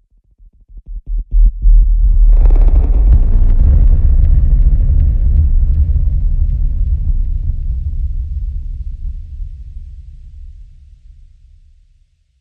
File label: Sub Fltr Barbette